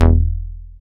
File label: Dance Bass; Sound & Vision - Gigapack II CD 1